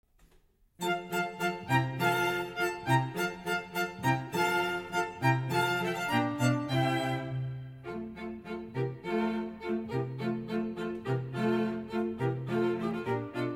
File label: String Quartet; Vivaldi